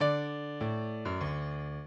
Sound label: minuet, mozart